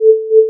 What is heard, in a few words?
amp Envelope, tone